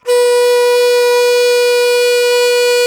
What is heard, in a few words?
World Winds, Old World Instruments; E-MU Producer Series Vol. 7 – Old World Instruments; World Combos